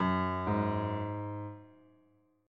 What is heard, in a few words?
piano; autodarts triple 15 alternative